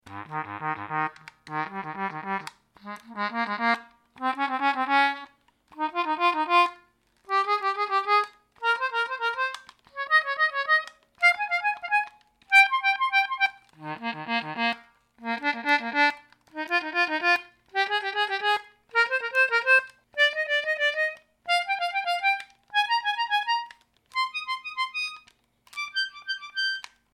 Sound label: reed response